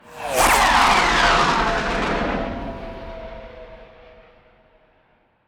CINEMATIC, WHOOSH, Upfront Short, Jet Sharp Ricochet Metallic Sliding Whipping; Pole Position Production; The Cinematic Transformation Library